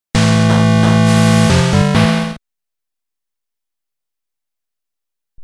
Mission Complete; Wily Appearance; Metal Gear Acid 1 & 2 (Psp); rockman 3 burst chaser hack; nes

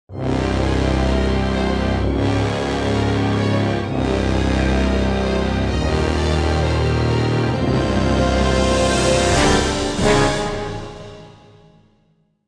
Medieval Agitated; Civilization Revolution

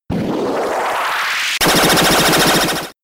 Charge Beam

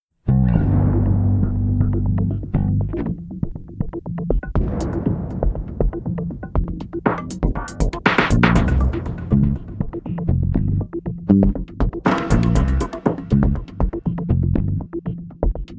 Explore Gm 120bpm; Disney Infinity 2.0 Marvel Super Heroes; Arachna

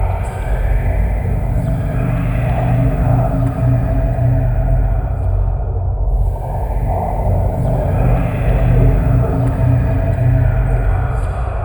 shadow loop; kage

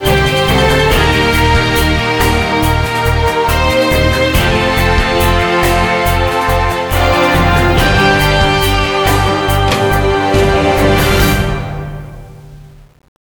Jingle Won; Emergency Disaster Rescue Squad; nds

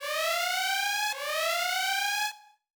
soul appear loop

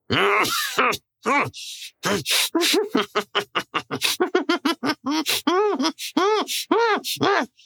inmate grab

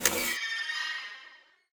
Spirit Dies

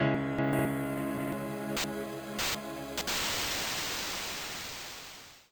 Retry Jingle; gameOverExpunged; friday night funkin vs. dave and bambi

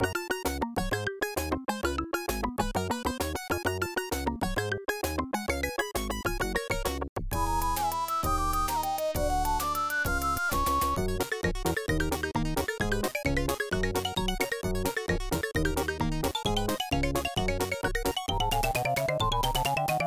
Bonus Level; The Berenstain Bears Camping Adventure; Genesis

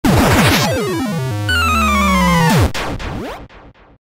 Death Sequence; Super Head Esploder X; Android

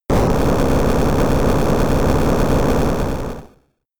Earthquake

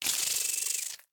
Spider Attack; The Inn

